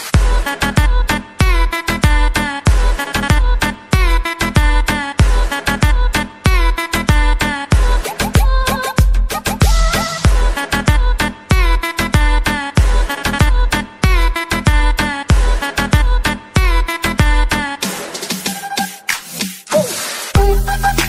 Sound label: cool ringtone